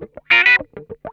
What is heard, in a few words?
OUCH, Funk Guitar; Zero G - Funk Guitar